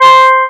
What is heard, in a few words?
guitar; Matlab